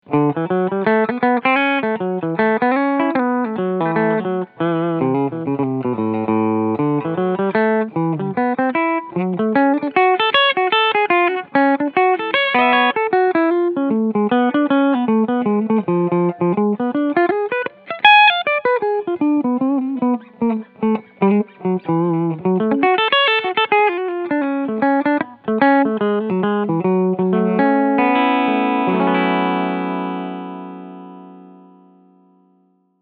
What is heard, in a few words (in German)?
Harley Benton Aeolus Cleansound Steg Humbucker